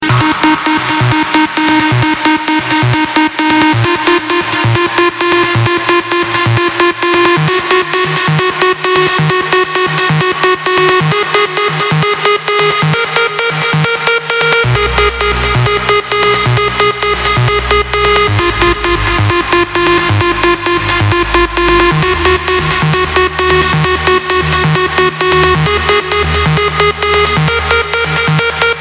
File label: trance